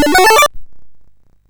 sid MOS6581R2

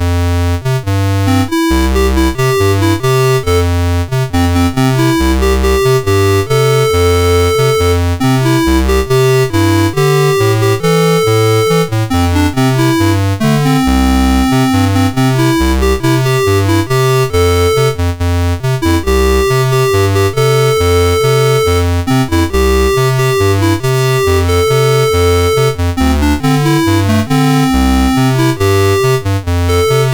MOS6581; MOS6581R3

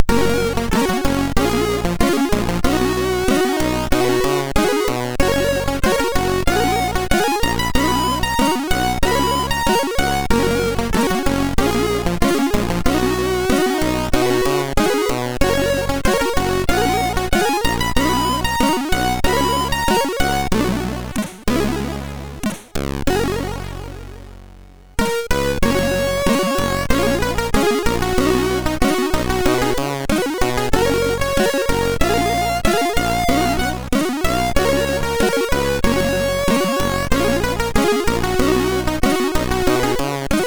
MOS6581R3, sid

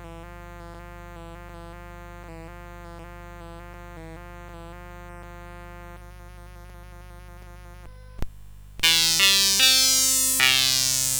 Sitar Demonstration, CSG8580R5

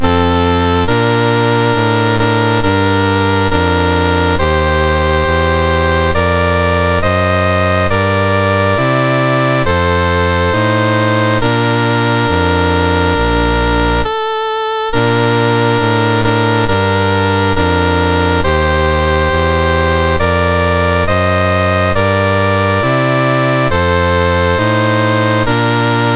MOS6581R2, National Anthems; Kedah

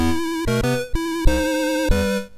MOS6581R3, sid; Night Knight

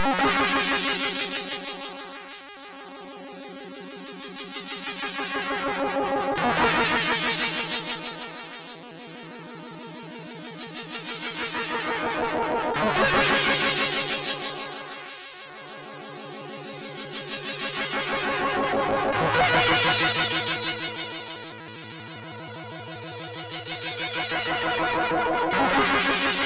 Ambient Music, MOS6581R3; hvsc

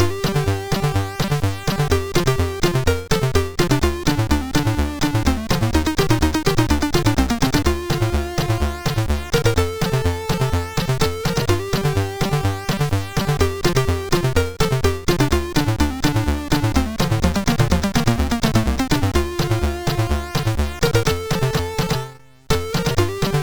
sid MOS6581R2

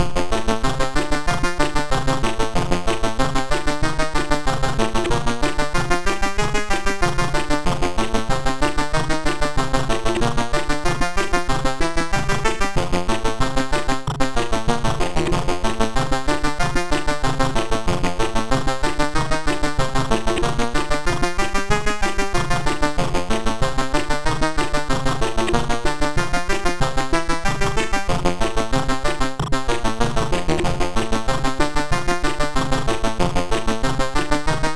sid MOS6581R3; Toobin

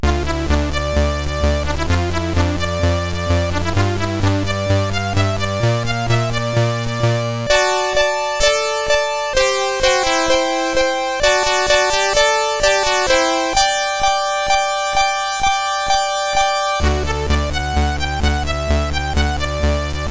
Highfly, CSG8580; CSG8580R5

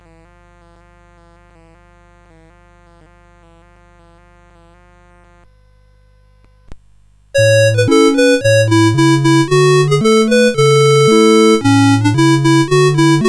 Marching Through Georgia; CSG8580R5

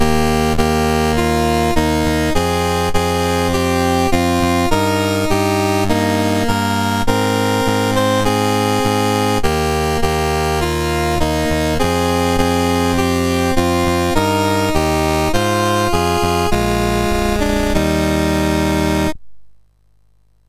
sid MOS6581R3; Games Summer Edition menus

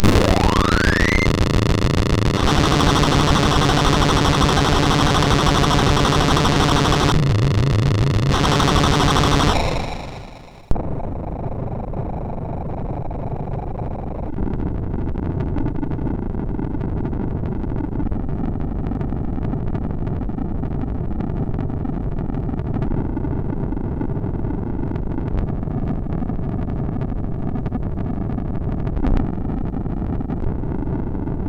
Die Alien Slime, MOS6581R2

